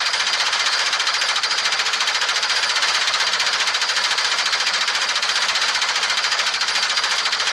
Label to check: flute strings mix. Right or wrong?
Wrong